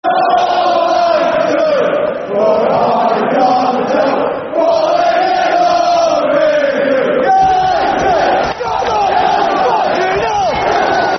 [Football Chant (Soccer Song)], Sheffield; Sheffield Utd Sufc